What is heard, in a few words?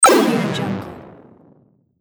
retro game power down